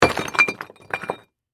Crash, Rock, Debris, Small, Dump; Rock Sound Effects; BlastWave

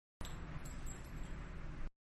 Guns Emptying Cartridges several; Gun Handling (Sound Effects:Guns); Manhattan Production Music

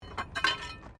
METAL SPIKE FALL; Materials

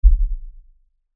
LFE Ultra LFE Hit, Lfe Ultra Low Bass Hit Impact; Deep Tones; Sound Design

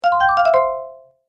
Happy Game Bonus; Video Game Sound Effects

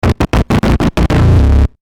Stutter Low Buzz

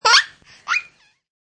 Tdlr Lghng, A toddler laughs.; Toddler Laughing; Laughter; Voice

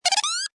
Cartoon Chirp, Cartoon whistle, comedy zips; Comedy/Cartoon CHIRP; Funny Sounds

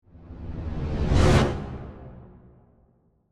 Crescendo Whoosh, Dark, Airy; Crescendo Sound Effects; Blast Wave

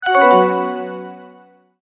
Multimedia Tone-Return/Back; Sound Design; McKinney Sound

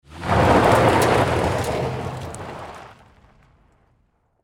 Vehicle Auto Drive Off Gravel Fast Automobile Car

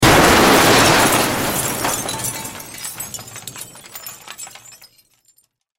Crash Metal Glass Debris Large Object; Crashes; Blastwave

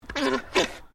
ANIMAL ALPACA MALE GRUMBLE; Alpacas on a farm in Gippsland Victoria; Miscellaneous Animal; SoundEffects-Foundation1

